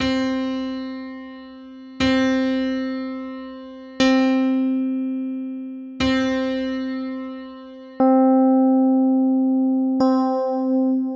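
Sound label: keys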